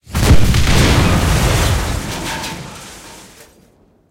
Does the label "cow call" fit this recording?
No